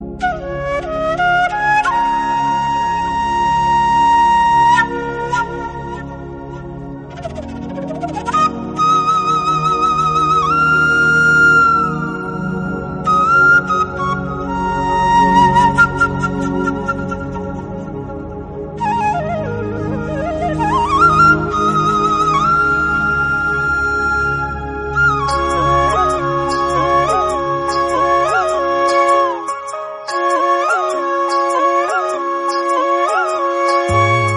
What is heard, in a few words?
Flute Ringtone